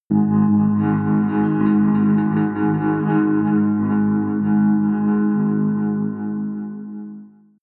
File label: synth drone rattle rhythmic, drones